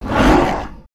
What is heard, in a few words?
melee; ManEater